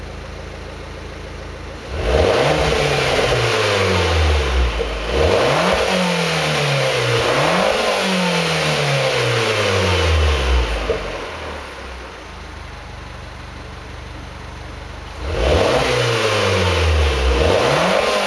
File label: 500 ABARTH, tuneup